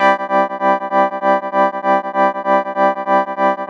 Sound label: B3, Synth Hits; Zero-G; Soundclash Nu Dance Electro; Acidized